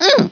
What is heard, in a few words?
pain